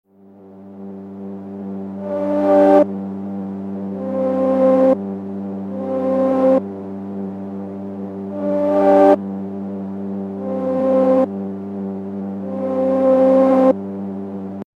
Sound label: Noisy Neosoul